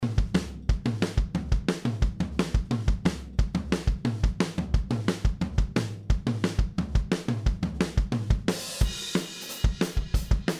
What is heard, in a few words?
177 bpm groove; gareth grover snake beats; drumdrops